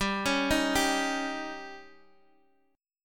Eb 7th

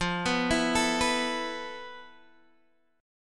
F Major 7th Flat 5th Guitar Open G, chord; down; chord shape

